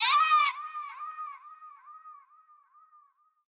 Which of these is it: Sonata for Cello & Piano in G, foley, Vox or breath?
Vox